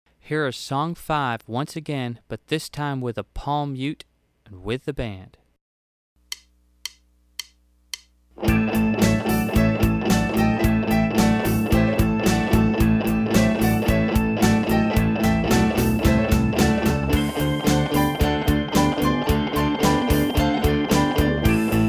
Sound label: Mute Fast